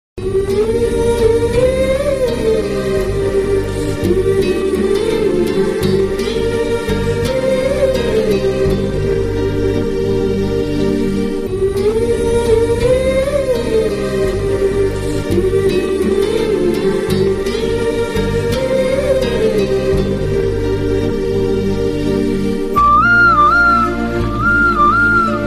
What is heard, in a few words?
Most Heart touching Flute Sad instrumental